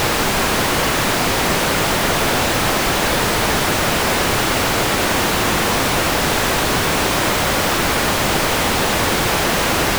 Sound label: noise